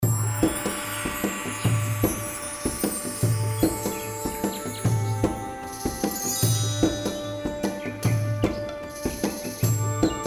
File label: Indi Groove